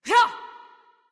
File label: grunt attack; smite; isis